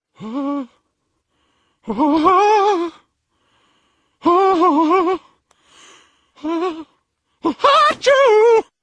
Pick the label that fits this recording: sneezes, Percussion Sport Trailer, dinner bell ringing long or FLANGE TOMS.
sneezes